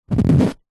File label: household blind fabric finger scratch rub